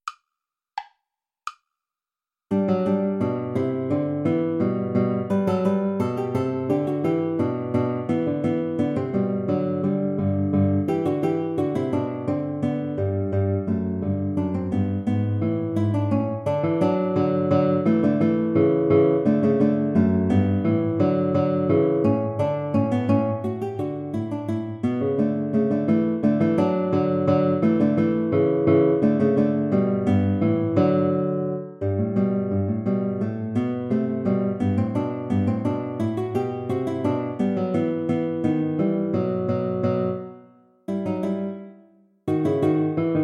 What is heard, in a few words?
bourree play along without guitar; telemann georg philipp